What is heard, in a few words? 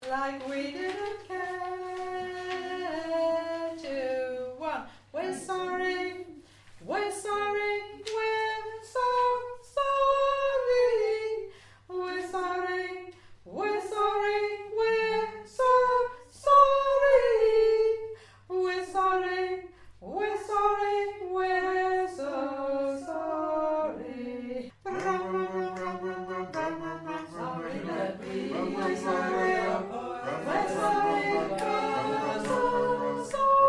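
soprano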